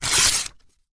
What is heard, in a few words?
Book Paper Single Page Rip